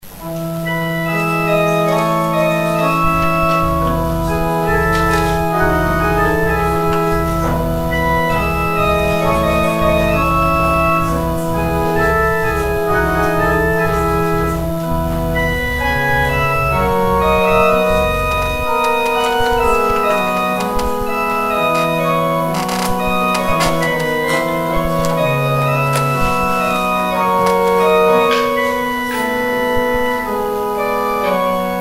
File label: organ interlude; Organ interlude during the offering